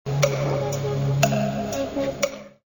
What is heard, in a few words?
tik tak